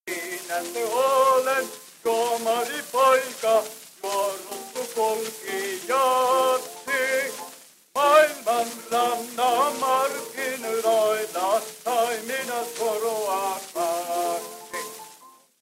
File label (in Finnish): kohinaportti 20dB pito 1s